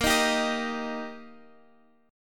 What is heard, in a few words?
Eb Major Bb